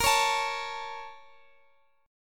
Bb Minor Major 7th, Guitar, Drop D; strummed; x,x,x,15,14,17

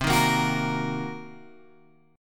C Minor 7th Flat 5th Guitar Open G, chord

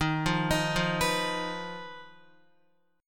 D sharp Suspended 2nd Sharp 5th, Guitar; arpeggiated; chord; x,6,5,8,5,7; JGuitar

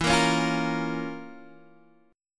F Major 7th Suspended 4th Sharp 5th E

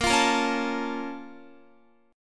A sharp Minor Major 7th, down, Guitar; A#mM7 strummed

